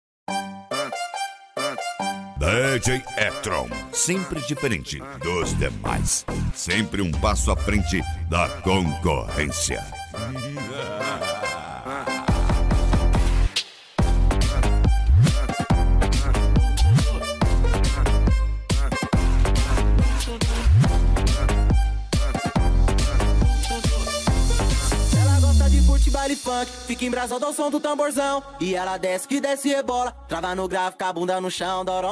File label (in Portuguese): mega funk funk